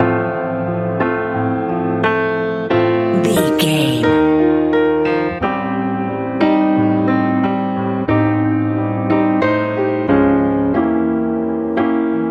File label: nice bright lite pop